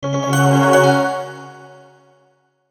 Logo Bells Synth; Sonic Logo Sound Effects; Production Element Imaging Element Accent Transition

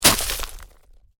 Ice impact heavy, Ice: heavy impact with debris; Snow; Weather; Stuart Duffield

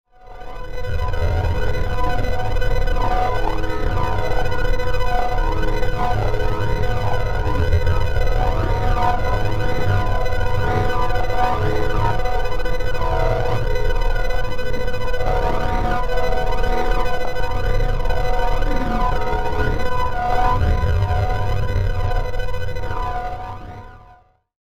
Killer Laser Drone, laser gun sound effects; science fiction sound effect

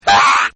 SAX Riff; Horns; Musical; Partners In Rhyme

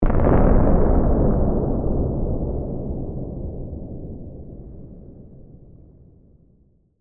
Explosion Distant, Blast; Warfare; Bombs; Explosions; BlastWave

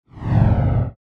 Whoosh Phaser, Dark; Whoosh Sound Effects; SoundEffects-BlastWave

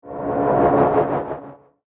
Ancient Sf Machinery; Science Fiction Sound Effects; star wars / trek style

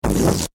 Foley Balloon Expel Air Fast; Balloons; Cartoons

